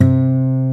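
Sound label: C 2 MARTIN, acoustic guitar; AKAI S6000 CD-ROM - Volume 1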